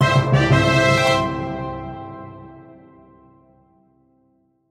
Level Completion; luna and the moonling linux macos windows gamerip 2018

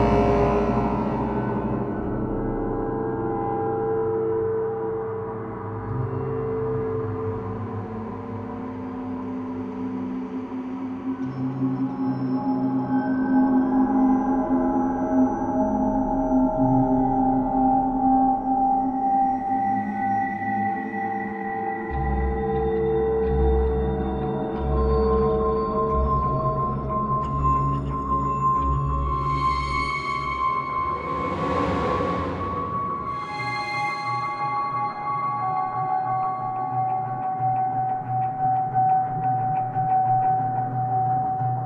Explore Suspense

